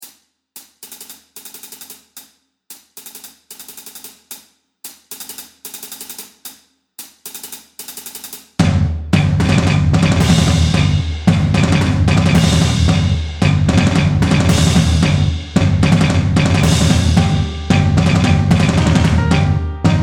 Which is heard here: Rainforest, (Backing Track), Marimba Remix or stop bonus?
(Backing Track)